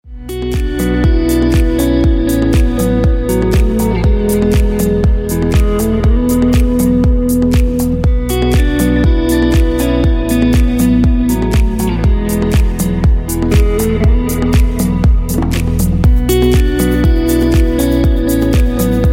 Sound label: instrumental remix; Рингтоны Без Слов; Спокойные И Тихие Рингтоны